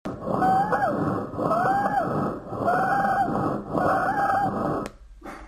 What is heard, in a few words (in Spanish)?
sibilancia en la espiracion